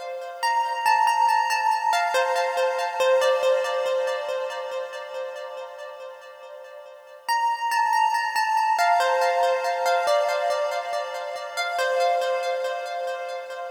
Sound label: Soft Pluck Main WET 140BPM, Gminor; Melody & Synth; Vengeance Samples; VTS1 Devastating Truth Kit